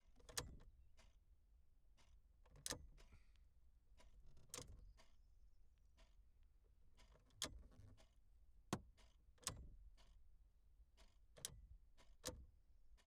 polonez 1500 wiper switch interior pov, Police Car; FSO; Pole Position